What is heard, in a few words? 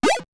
Cane Magic; Zelda; LTTP